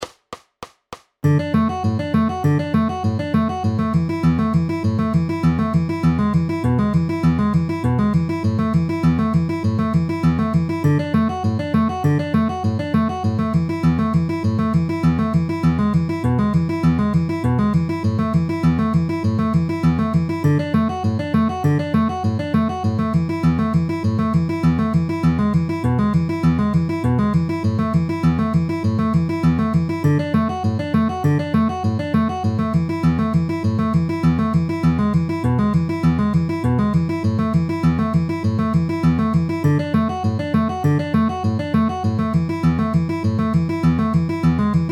D A E A inner outer ring ring with alternating bass at 200 bpm